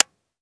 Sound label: akilo47 disconnector; weapons; viper